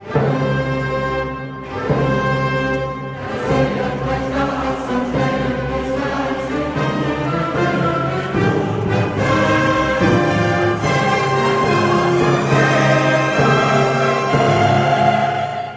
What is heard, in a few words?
great echo